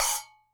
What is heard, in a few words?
Splash Acoustic Small